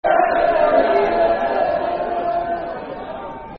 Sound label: You Reds football chant (soccer song); Brentford